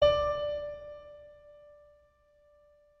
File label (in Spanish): re agudo, musical notes; websim